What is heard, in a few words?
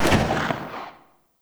Revolver Pistol Tail